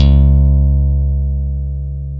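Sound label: Chapman Stick, bass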